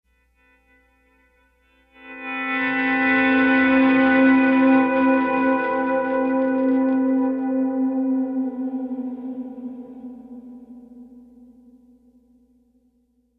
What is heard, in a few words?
Pleasant Guitar